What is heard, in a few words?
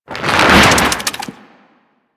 Infested Devourer Movement Whoosh A; Warframe